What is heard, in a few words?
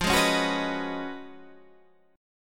Db 11th F, guitar